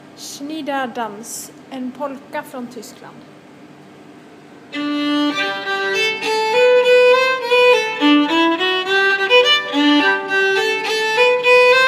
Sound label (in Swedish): Sniderdans Från Tyskland